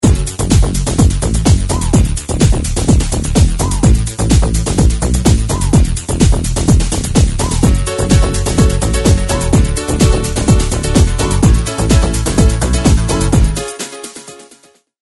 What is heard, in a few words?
Keep on Dancing Instrumental; cstrike, mapend